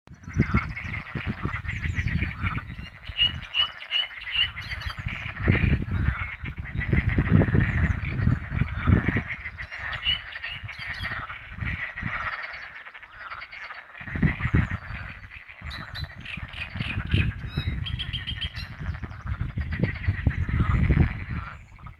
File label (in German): unken